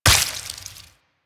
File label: Vial Viral Shatter; Warframe; Alchemist